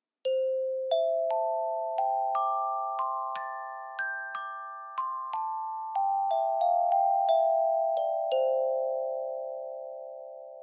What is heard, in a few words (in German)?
AGd mit einem Schlägel; studio-49